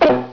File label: IDLE, robots